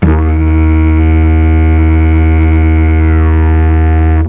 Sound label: drone, didjeridu